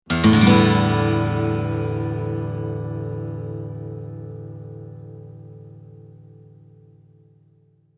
Open acoustic guitar strum.; Musical

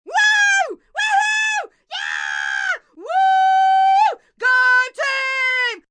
Cheer Woo Woo, Cheering, female; Crowd Sound Effects; VoiceBox; Twistedtracks

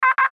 Radio Morse Code; Morse Code Letter M; Morse Code (Sound Effects:Electronics); Blastwave; Warfare

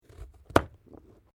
PUMPKIN CUT; Various food preparation; Punch And Hit Sound Effects; Punches and Hits (Sound Effects:Combat)

